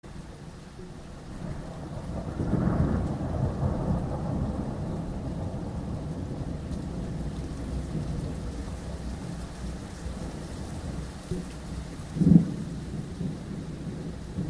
thunderstorm early hours

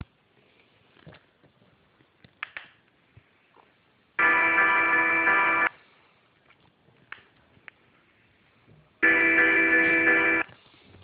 sound interference

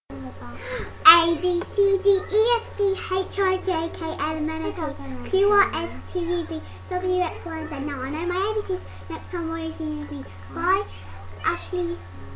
singing the ABC

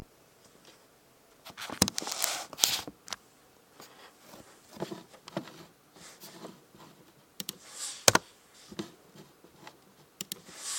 five minutes of office ambience

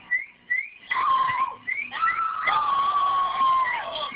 screams of death

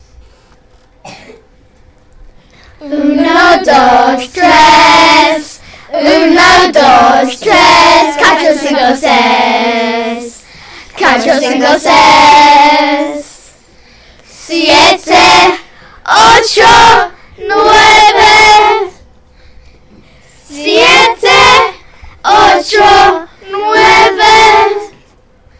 number song in spanish

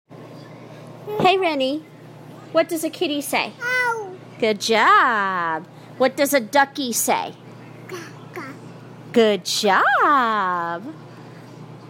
animal sounds

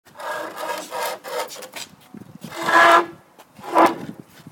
gate

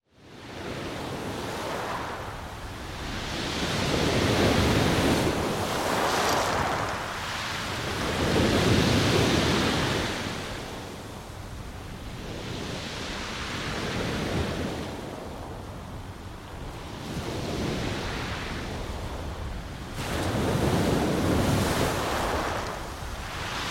shingle street suffolk surf

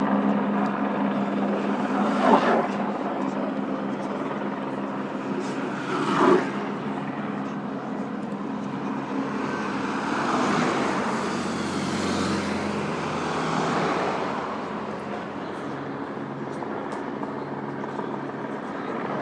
noise of tandem time trial bike at close range

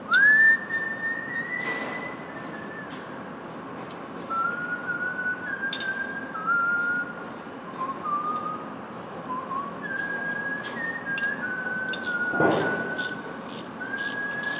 whistling; at bfpeas

